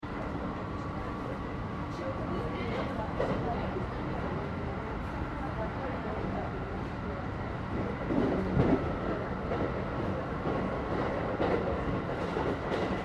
Ambience Train JR Regional Aomori Operating People Talking